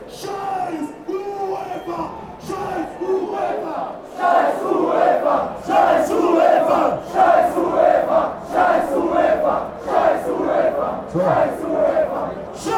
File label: scheiss uefa fanchants